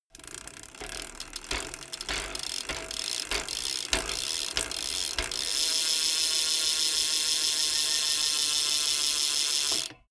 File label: bmx bike pedal accelerate freewheel stop